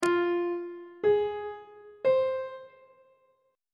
g sharp ionian chord 6 is e sharp minor on treble clef